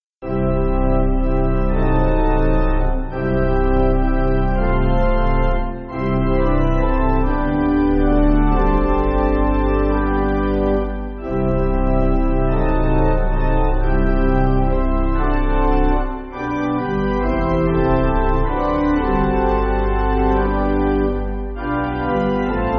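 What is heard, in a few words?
Pipe Organ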